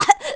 Choke; Link choking in water; Zelda

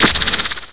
Vulnerable; Vaati becoming vulnerable; Vaati Reborn

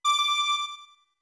Notes Music Box D2 med; OOT

